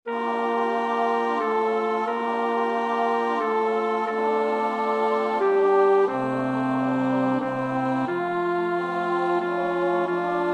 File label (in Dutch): bas; Rachmaninoff (Serei)